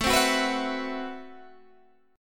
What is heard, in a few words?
A Minor 6th Add 9th A Guitar Open G